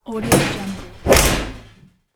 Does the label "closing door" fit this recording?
Yes